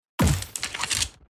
Reading bow

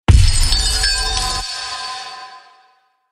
aggressive

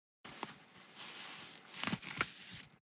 Cloth movment

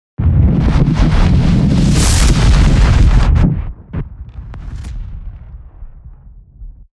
Fire element spell

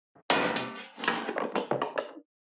metal texture

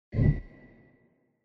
UI moving option to one anoter

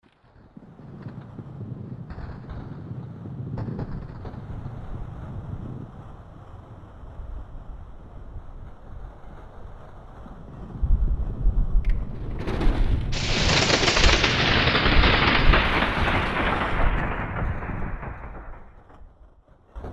thunder

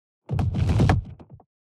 fall down

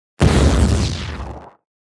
Thunder element

